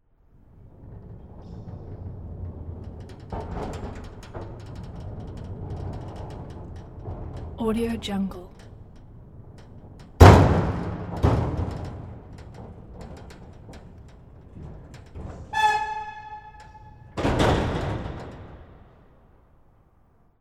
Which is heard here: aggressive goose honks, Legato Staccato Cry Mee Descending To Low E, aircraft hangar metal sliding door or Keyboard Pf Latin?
aircraft hangar metal sliding door